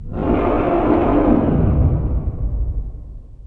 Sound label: dragon roar distant; eqclassic